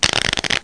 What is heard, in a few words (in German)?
karten; wcanasta